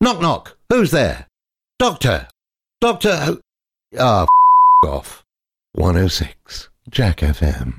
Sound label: knock knock